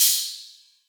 Big EDM Ride

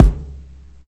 kick

